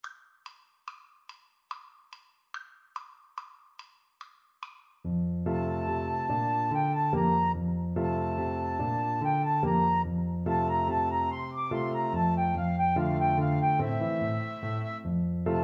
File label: flute sax, Flute Saxophone Duet